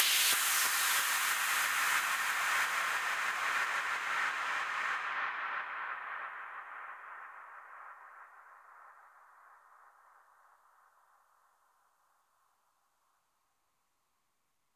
Synthwave 91bpm Sweeps; hot lava; ios macos windows

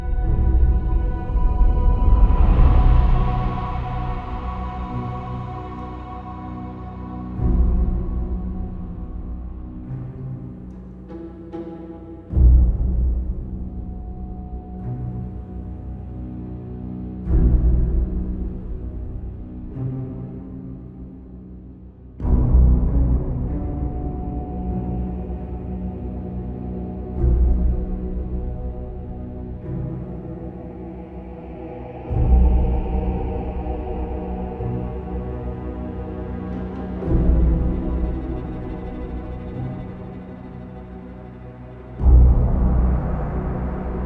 Main Theme Loop; bramble the mountain king; ps4 ps5 switch windows xbox one xbox series xs

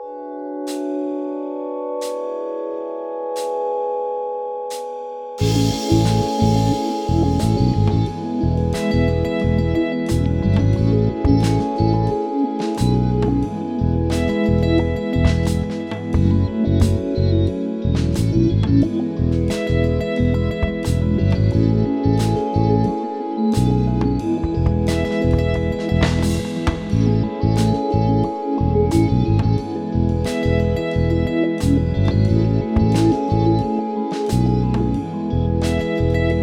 Calm Day at the Store; Negligee

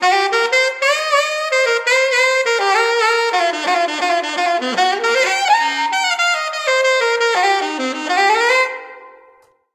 Jazz Opening; Sam & Max The Devil's Playhouse; Sam & Max Season

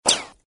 Bullet Ricochet; Acid Rising

